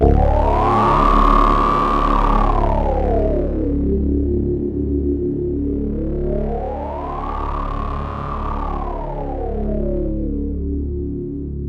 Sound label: FX SWEEP